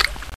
Slide; Lewd SFX

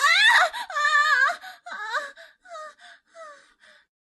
Long Scream

